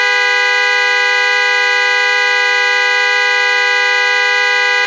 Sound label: spiral double, horn